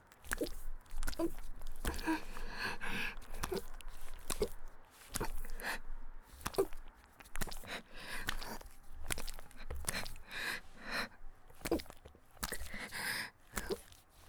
木枯らしバージョン ギシアンループ; 田舎の別の無感情少女と自己犠牲愛; おまけ (第4、5話のギシアンループバージョン)

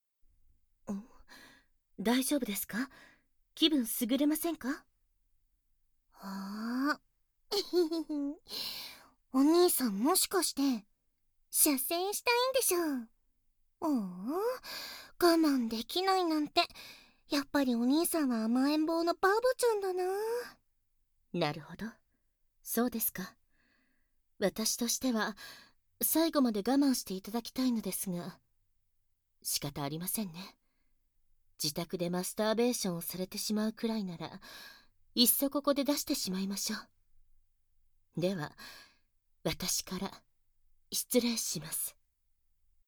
フェラチオのみパート

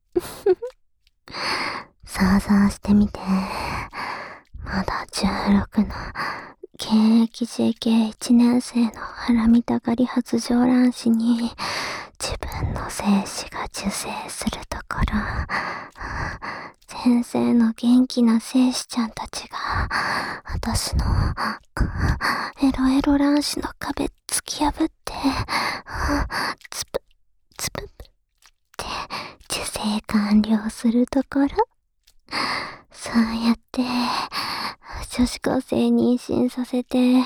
受精おねだり耳舐め; 小悪魔おしえごニートJKと子作りえっち♪

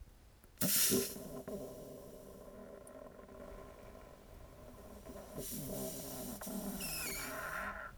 おなら, ASMR

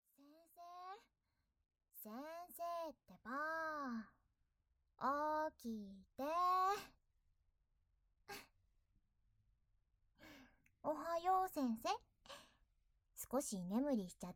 ダブル 耳舐め ノイズ除去済 効果音あり

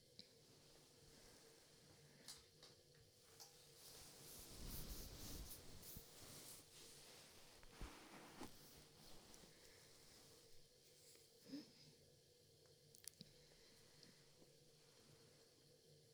お耳掃除（左）; おまかせコース; おやすみ口内セラピー

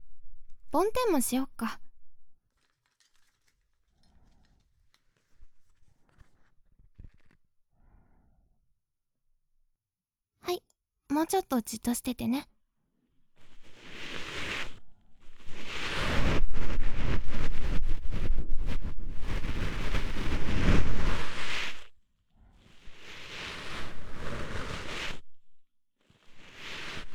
梵天 左

